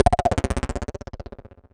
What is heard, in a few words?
STAB